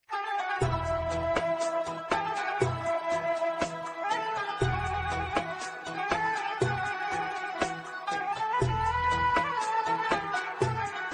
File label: Arabic Tabla